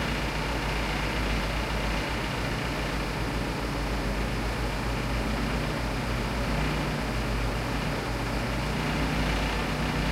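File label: m4a3 engine interior rpm 00, tanks; War Thunder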